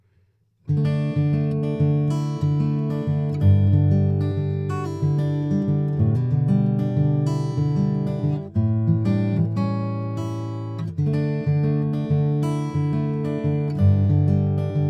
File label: Acoustic Guitar without AIR BAND AKG C414